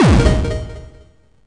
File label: net; stardunk ios gamerip